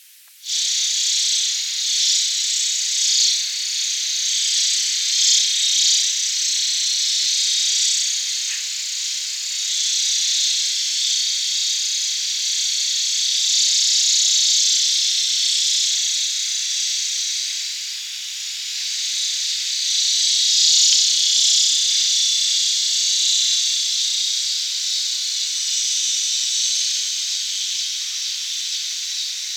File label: Neotibicen linnei